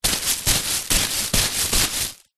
plastic bag full soft duvet impacts push down several; zapsplat